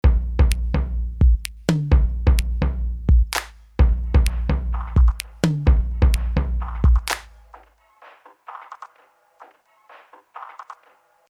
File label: 128 tom kick and noise; Loop Mania Sampler Pack